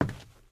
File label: WOOD, steps; Terrarum